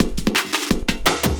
LOOP, 170 BPM, Drum & Bass

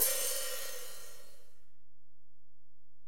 HiHat, Alesis DM-PRO

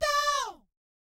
DOW D5, Vocal Planet Jazz & FX; ILIO; JAZZCOMBO

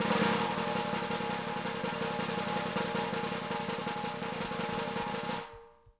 ROLL, BLAK TAMA; Sampleheads; New York City Drumworks VOL-1; SP BLAK TAMA

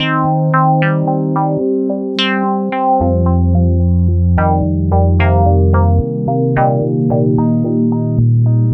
Melody, IDM

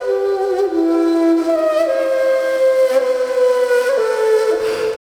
SIERRA FLU

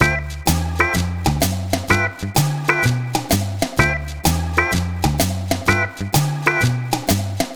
Swingerz Full, Lounge; Zero-G Kocktail Kollection; Construction Kitz (Lounge); Swingerz Mixes 127

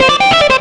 xtra lead

